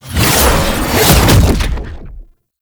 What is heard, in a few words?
Stab Cloak Exit; Enemies; Abilities; Warframe; Tau; Dax Ninja